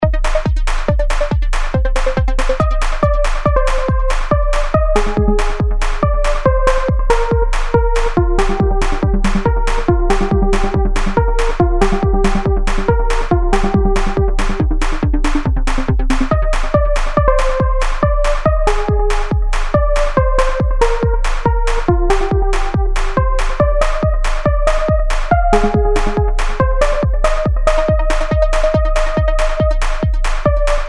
TRANCE MIX

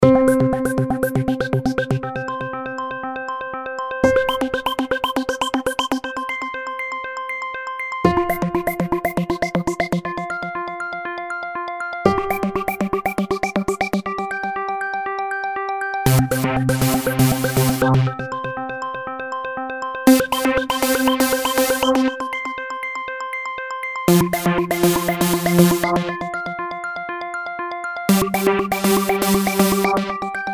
Dance Version

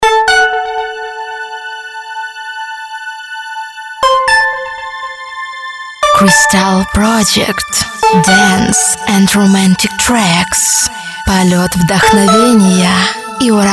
Trance Version

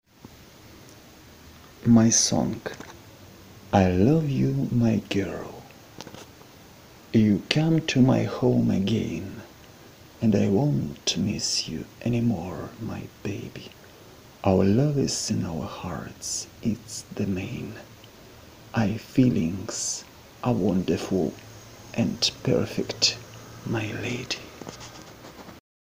Lyrics Voice Version

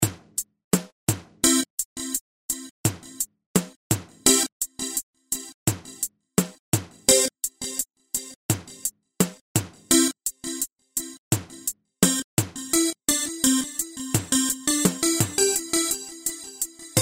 Relaxation music for rest

